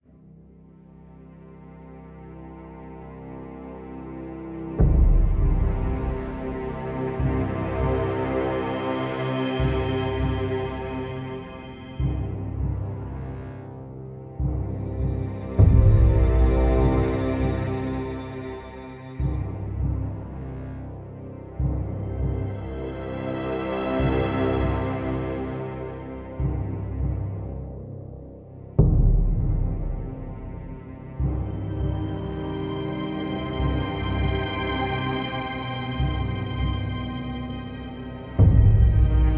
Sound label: Loop Suspense